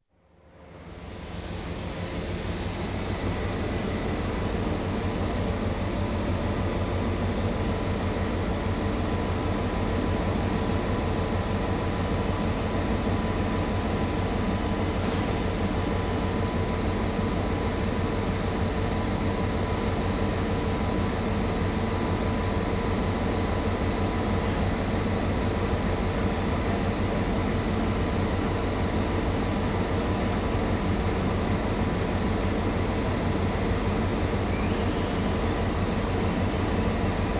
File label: Diesel Trains Mainline express; BBC Sound Effects Library